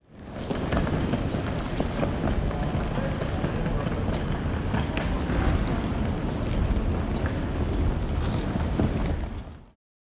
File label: travelling people ambience